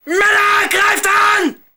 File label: attack